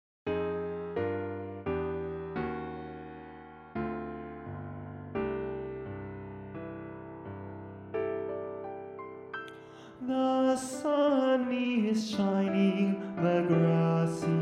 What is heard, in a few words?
basses